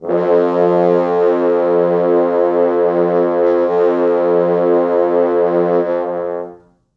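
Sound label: 4 FrHorns SUS, French Horns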